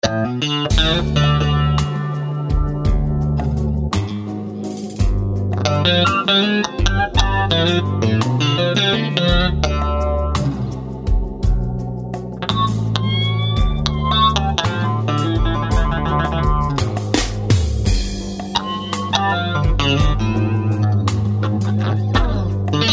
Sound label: E-Guitar Chorus Distortion Blues